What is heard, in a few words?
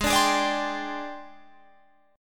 G sharp Major 7th Flat 5th